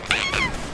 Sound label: gremlin Scream; IRyScream